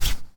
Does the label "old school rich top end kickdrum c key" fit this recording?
No